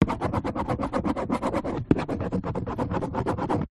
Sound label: pen ball point write scribble on empty card box; office